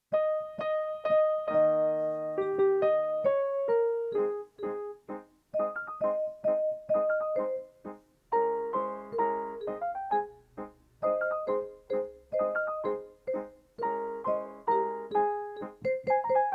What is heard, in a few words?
Piano Vibra